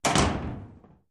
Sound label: Elevator Gates Doors